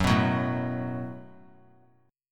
Gb Minor Double Flat 5th Gb, guitar; strummed; 3C,3F,3A,3D; 18,18,21,19

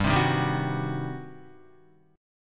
G Major 7th Suspended 4th Sharp 5th, Guitar; strummed; 4F,4A,5C,5G,5C,6E

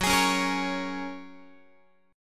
Gb Major Add 9th, down, Guitar; strummed; JGuitar

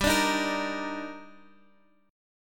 D sharp Major 7th G

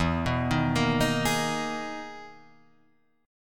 E Major Sharp 11th; chord shape

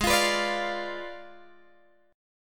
G sharp Diminished 7th, Guitar, Drop D; 18,20,21,19,18,x

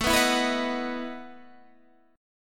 A 6th Suspended 2nd Sharp 11th, guitar

